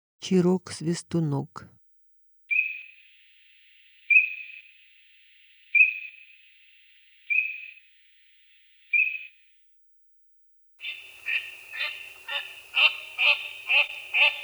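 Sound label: common teal voice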